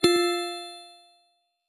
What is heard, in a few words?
jingle; PetitFrere